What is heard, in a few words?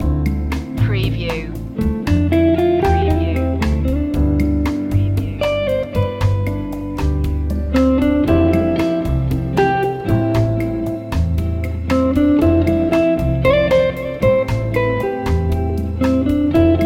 moody bossa